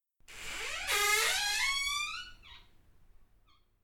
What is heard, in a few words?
The Door Creaks